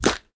dry clap single shot f sharp, Royality free hand clap sample tuned to the F# note; single hits one shots